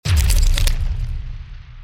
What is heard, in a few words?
boom